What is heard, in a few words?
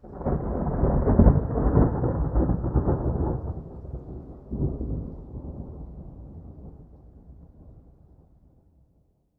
Thunder single peel; boom library; Thunder And Rain